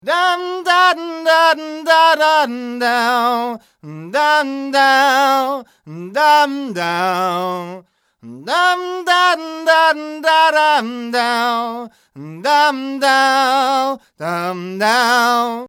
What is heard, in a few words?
t.bone SC450 S2 far